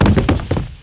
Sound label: owl duke die; classicro